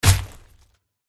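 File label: spider attack contact middle; pauchiha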